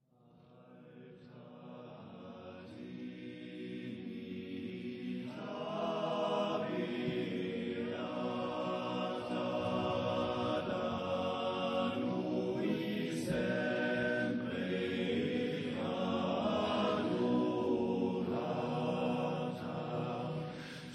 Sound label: Alta trinita beata